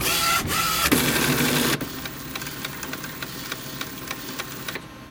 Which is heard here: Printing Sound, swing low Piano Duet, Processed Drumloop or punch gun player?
Printing Sound